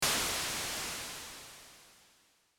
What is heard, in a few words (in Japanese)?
ホワイトノイズ